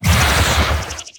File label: Pain Light; Evolve; Wraith